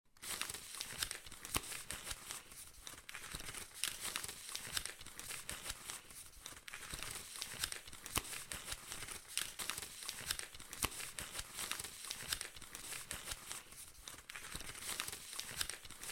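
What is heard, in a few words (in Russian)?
быстрое перелистывание шуршание бумага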